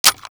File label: m40a3 loop, foley